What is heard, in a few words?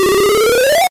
Gauge up; puchicon; dsi ds